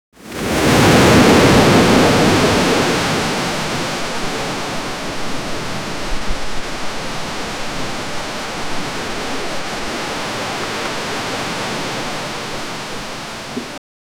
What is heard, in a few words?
deep roaring huge wave cr